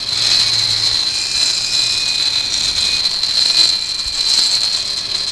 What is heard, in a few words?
grind metal; terrains; Tony Hawk's Project 8; XBOX